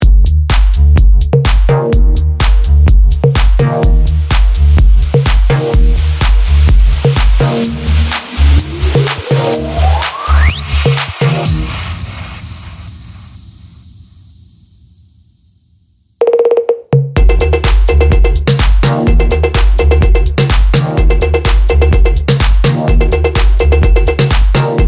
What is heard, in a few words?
Beat Loops